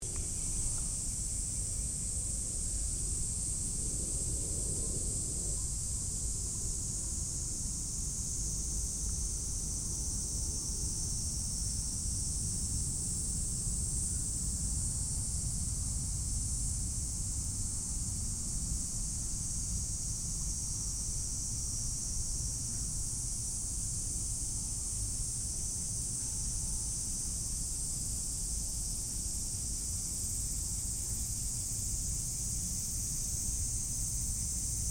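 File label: Najima castle ruins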